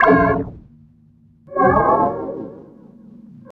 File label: Rhodes FX; Drum&Bass; fx & sweeps; EZRollers